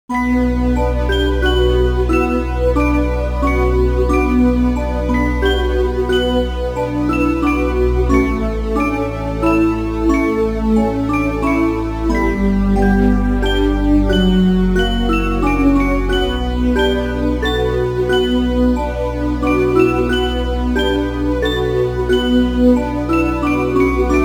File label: Cutscene BGM; Reflex